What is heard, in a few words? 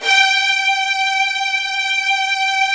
HDS G3, Virtuoso Strings; ILIO; Kirk Hunter Virtuoso Strings VOL-1